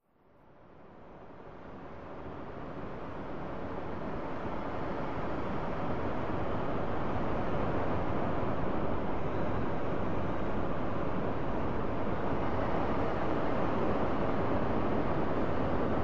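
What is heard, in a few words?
Steady Air Flow Air Flow, airy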